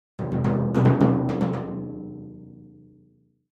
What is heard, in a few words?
Timpani Hands Roll; mallets timpani